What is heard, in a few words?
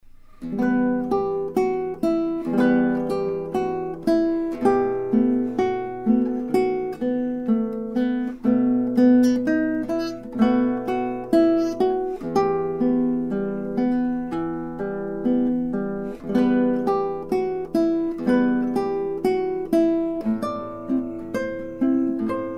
melody